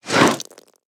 swing; zombi2

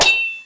hit, weapons

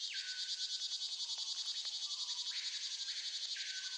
jungle, ambience

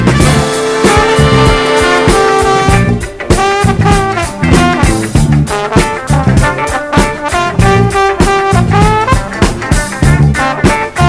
funky

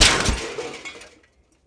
metal

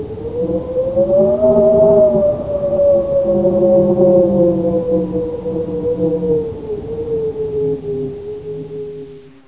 spook wind, ambient; wartso

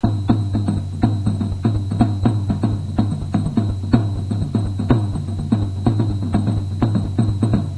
jungle drums

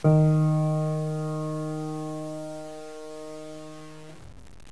piano dis2

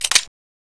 bolt, thompson

